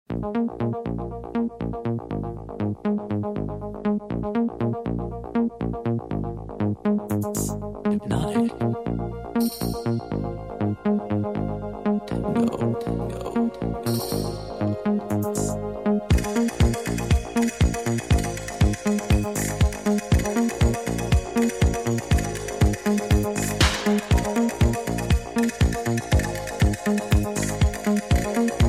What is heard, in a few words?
ringtone